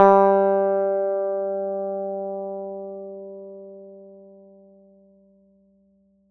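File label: plucked electric guitar G3; Polyend; Simulacrum Sounds; 1 Shots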